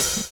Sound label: DISCO OH, DISCOKIT; USB Soundscan vol.11 - Drums Dance & Groove; AKAI